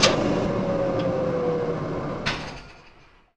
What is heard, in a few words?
airtight door stop; device